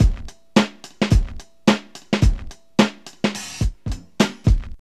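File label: 108 bpm, Funky loops; Zero-G; Funky Elements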